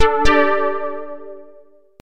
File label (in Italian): whatsapp semplice